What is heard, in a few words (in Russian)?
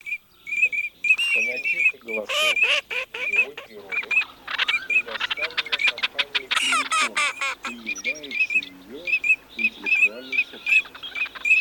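Чирок-свистунок Alzavola, манок; plurifon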